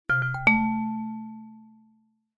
multimedia alert notification ui ping chime mallet like error; zapsplat